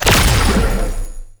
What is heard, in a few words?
Shot Large; Duviri; Incarnon Adapters; Weapons